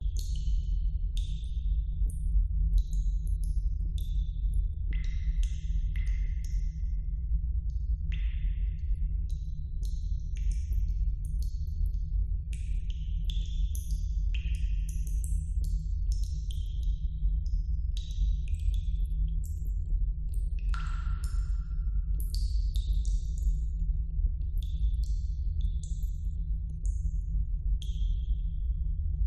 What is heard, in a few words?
ATMO NATURE Underground Cave; smartsound